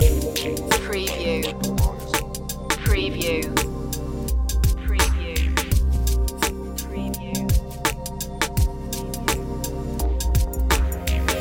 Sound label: abstract vibes loop, chill